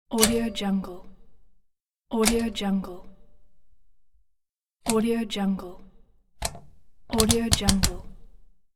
rotary switch

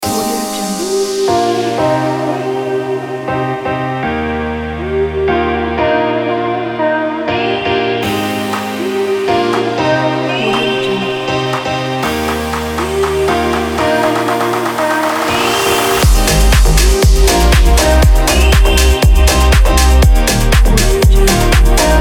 deep house

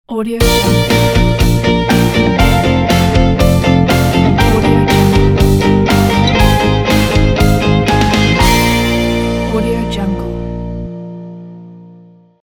indie lite rock ident

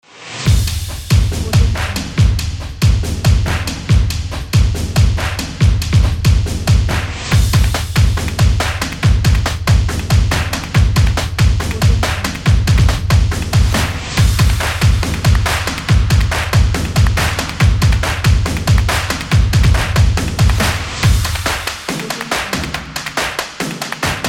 percussion drum on

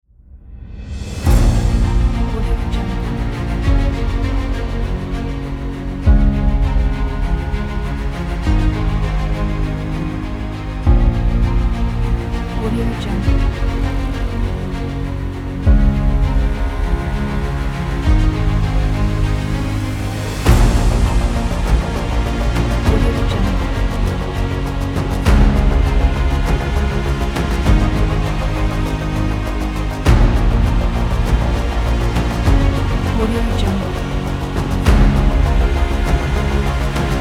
heroic

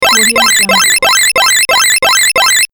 tally for retro game

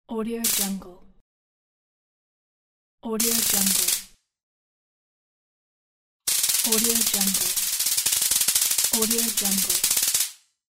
electroshock discharge

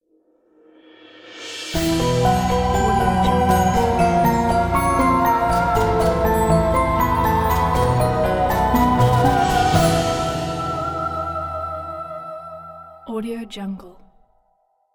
gothic vampire stinger

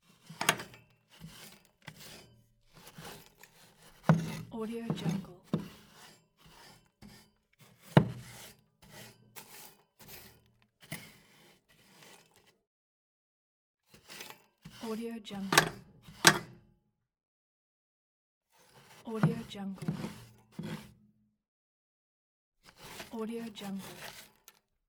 stove inside cleaning with brush

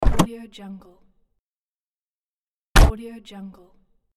car door

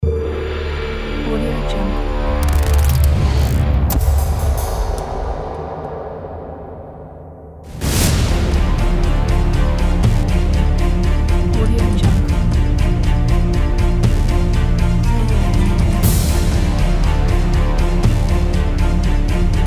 blockbuster trailer

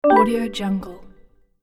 website discrete reveal

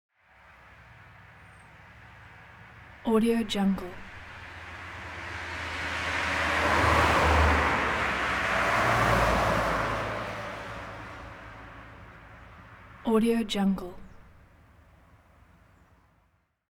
transportation car double drive by medium speed

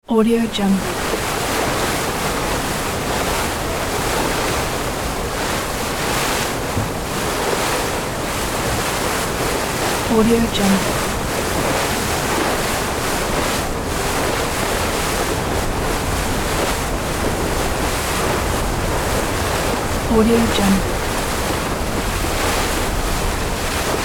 aircraft carrier bow cutting through water; sound ideas

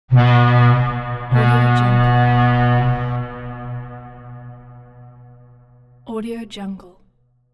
ship horn

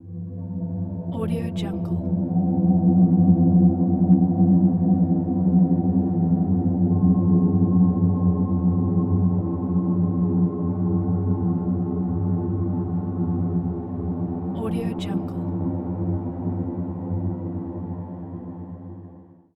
mysterious ambient

